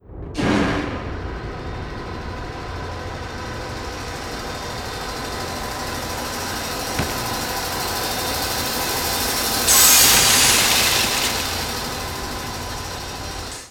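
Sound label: circular saw; limbo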